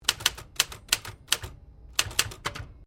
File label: Typewriter 1950s Sequence; Old typewriters; Typing; Offices